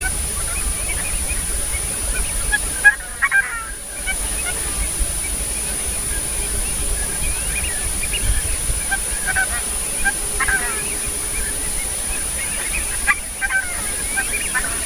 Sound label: coscoroba, Coscoroba coscoroba, Coscoroba Swan; cantos